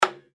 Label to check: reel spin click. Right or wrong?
Right